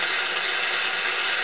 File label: SUCTION